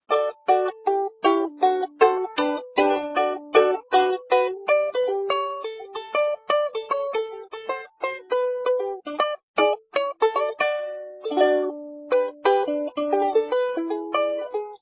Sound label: Picking